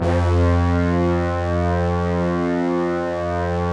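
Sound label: synth brass f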